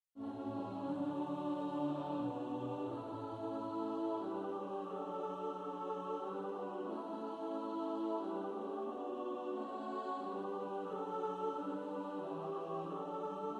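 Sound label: alto, practice music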